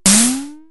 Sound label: Snare, Drumfire DF-500